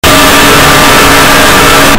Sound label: scream ear destroyer